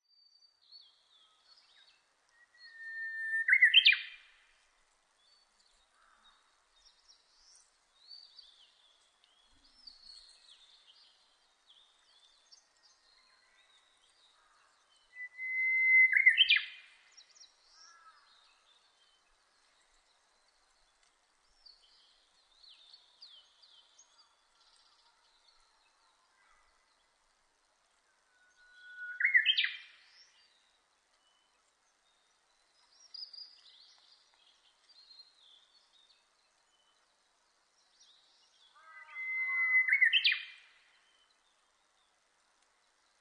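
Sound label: Japanese bush warbler; uguisu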